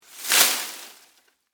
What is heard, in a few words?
Foliage Drop Move, Palm, Frond; Texture; Rustling